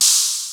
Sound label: Hi Hat, Cwejman S1 MK2